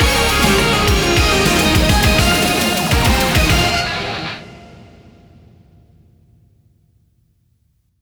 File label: hotline big Win end; netent